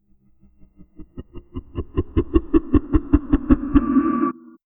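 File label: a faint ghostly laugh drifting; spectral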